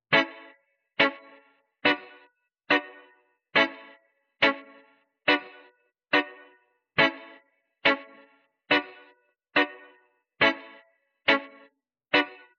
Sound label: music home guitar